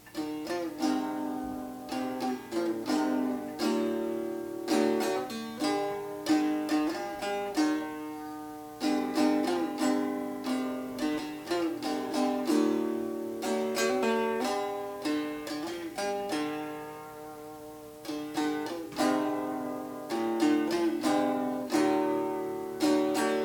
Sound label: Wood Banjo; Pretty Saro